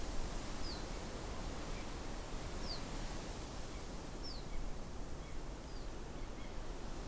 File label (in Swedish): vrango call; Lockläte; Sonogram Artportalen